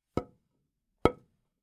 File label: Wood Impact